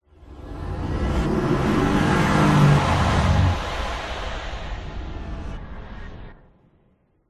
futuristic vehicle passby animation particle swish swoosh accent logo sci fi futuristic; alien